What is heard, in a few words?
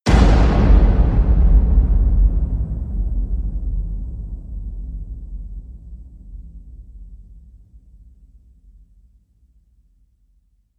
hit big rigged; brand x music